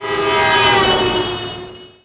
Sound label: freeway fall; Tony Hawk's Project 8; XBOX